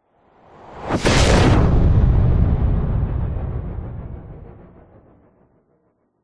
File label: sound design cinematic whoosh into a powerful explosion; zapsplat